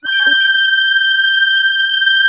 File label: C5, Synth Line; 90 s Sample CDs; NiceBeats; SynthLine VOL-17